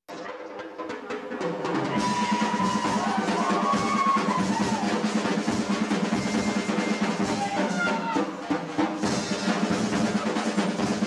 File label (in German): Schlagsolo